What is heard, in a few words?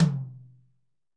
TOM, Toms, Roland; Northstar - Drumscapes Roland